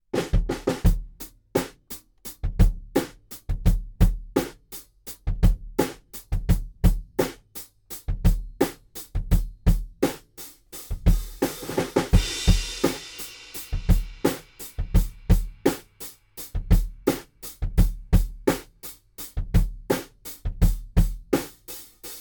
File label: drum room mic ECM8000 NOFX